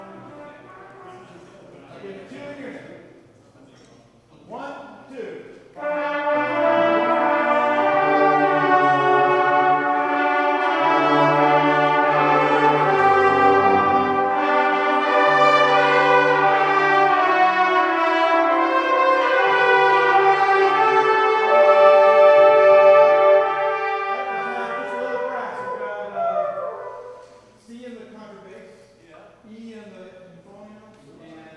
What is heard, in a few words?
Happy Birthday, brass; woburn ma